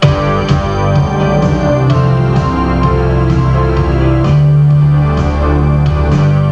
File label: Tune After Editing; MIDI Primer 1.0